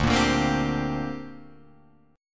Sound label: D 7th Suspended 4th Sharp 5th down Guitar Drop D